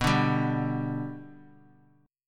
B Minor Sharp 5th B down; strummed; JGuitar

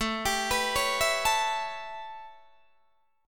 A 9th A, Guitar Open D

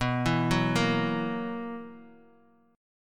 B Major 7th Suspended 4th, chord

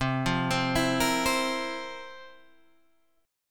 F Major 7th Suspended 4th

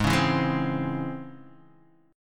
Db Minor Major 7th Ab

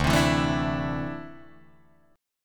D 7th Suspended 2nd, Guitar, Drop D

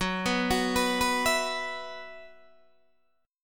F sharp 7th Suspended 4th, Guitar, DADGAD

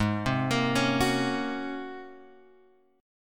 G sharp 11th, chord; G#11 Chord {4 3 X 3 2 2} arpeggiated

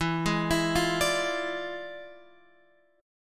E 7th Flat 9th Guitar Open F, chord; E7b9; arpeggiated

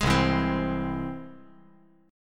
C Augmented down

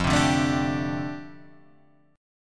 F 6th Add 9th F down, chord

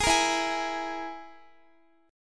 E-Major Flat 5th E, Guitar; EMb5 strummed

